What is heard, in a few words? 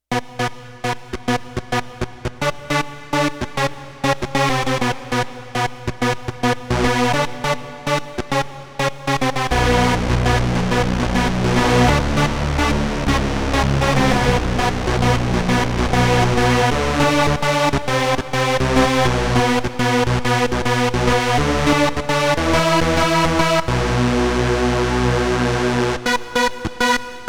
Soft Whoover, Roland VC-1